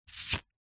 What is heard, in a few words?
household plastic flint cigarette lighter flick single; zapsplat